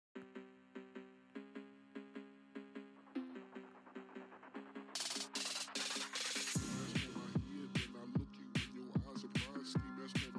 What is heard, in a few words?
Phonk